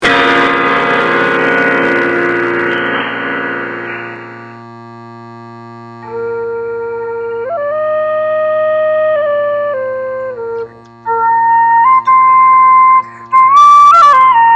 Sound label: Folk celtic music style